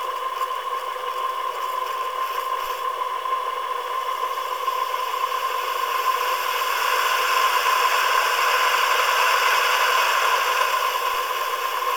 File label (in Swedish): fartyg